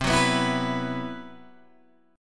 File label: C Major 7th Flat 5th C, Guitar Open G